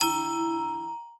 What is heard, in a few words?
ui card bounce